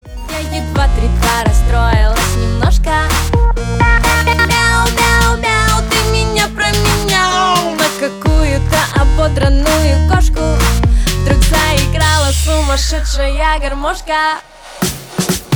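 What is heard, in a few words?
garmoshka, accordion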